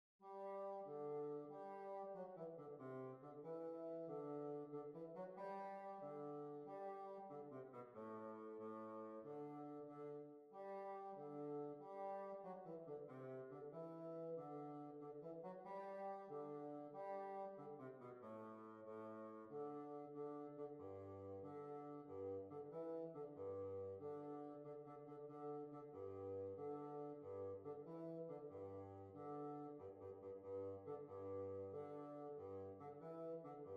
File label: Dance Bassoon